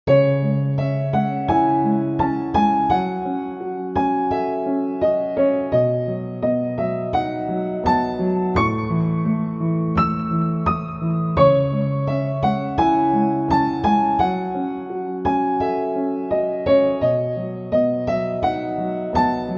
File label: piano; piano tutorial